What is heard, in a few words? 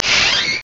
door open